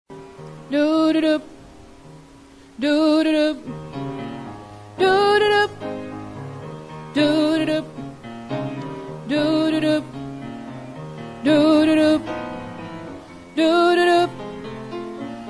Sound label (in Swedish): bas